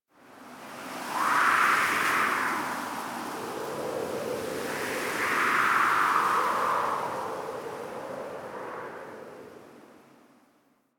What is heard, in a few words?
Wind Gust, Camp; Warframe; The New War; Drifter Camp